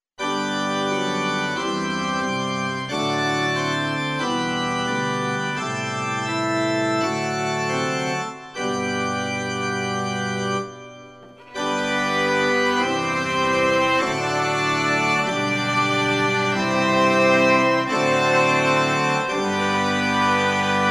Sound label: chorale